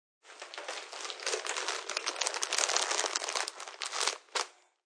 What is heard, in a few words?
Plstc Bg Crckl, Intense crackling of snack food bag; Plastic Bag Crackles; Crackling (Sound Effects:Objects)